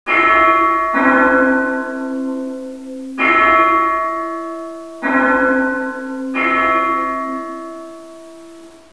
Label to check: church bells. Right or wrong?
Right